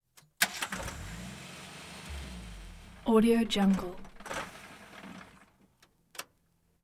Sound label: 1987 jeep wrangler; prosoundfx